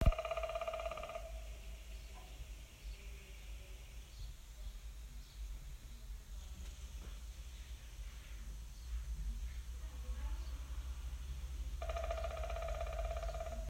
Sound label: woodpecker